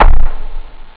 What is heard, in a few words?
bdrum; jambala; Amiga Soundtracker Sample Packs